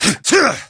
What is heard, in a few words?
side punch